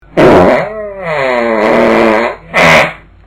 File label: fart